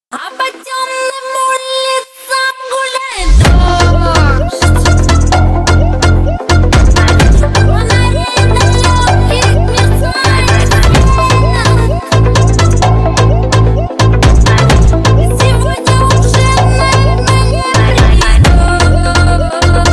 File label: phonk remix